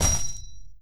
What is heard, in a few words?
dirt